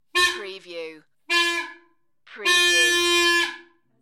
Horn Three Blows

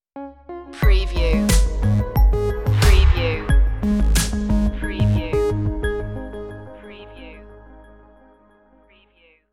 agony stinger

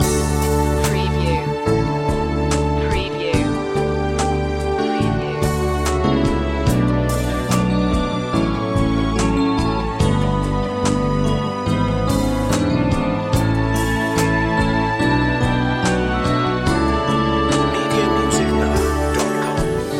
classical romance loop

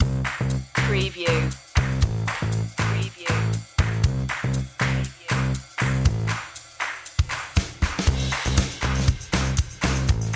happy go lucky drum and bass

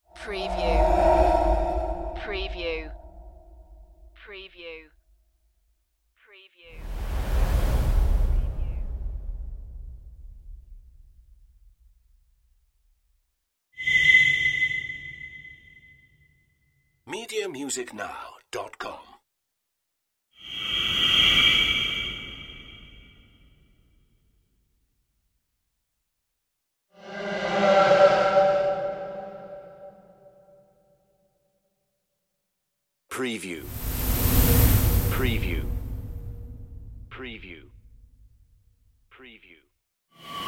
space whooshes

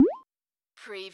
bubble

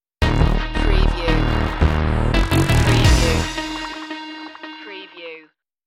lost in space stinger

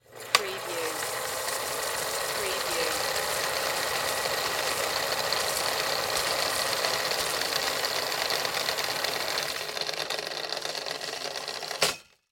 Film Projector Rewind

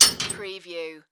Metal Clanks

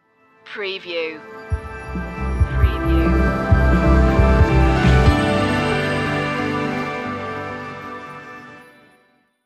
free stinger

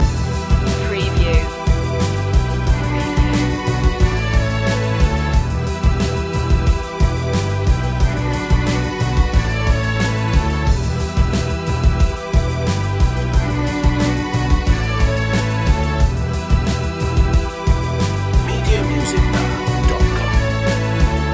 loop

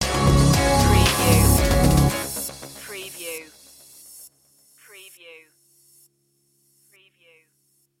windy summer stinger; media music now

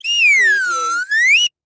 Slide Whistle Blow; sound effects bible

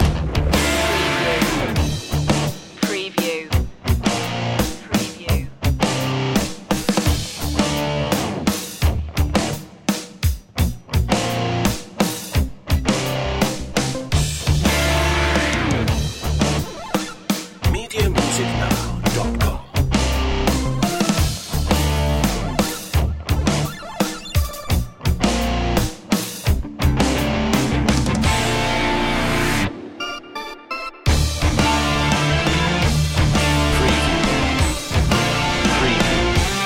8bit rocktronic

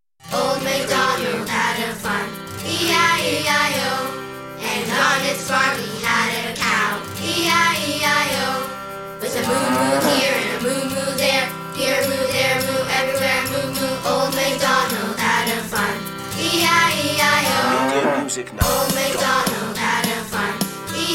old macdonald had a farm children group vocal

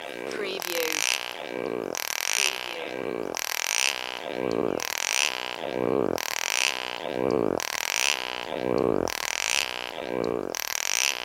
odd frog noise loop

